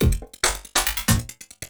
LOOP, 140 BPM, Drum & Bass

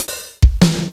DigiTech RP200; Sliced

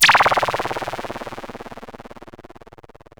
Sci fi

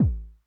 Kick Accent High Tone Mid Attack Max Decay, 909; classic machines

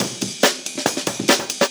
Break Beats, 140 BPM

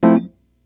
chords hits; HIT FM7; E-MU Producer Series Vol. 8 – Platinum Phatt; EP Chords Hits

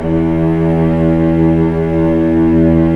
STR VC3, Vcs3 mf vb; String Master Series

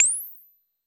U220 FX Set, Drum Machines; Roland U220

